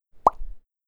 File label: pop